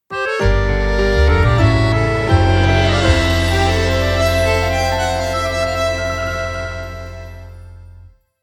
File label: Jingle Retro Elegant